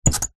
household door hatch wooden plastic latch close squeak; zapsplat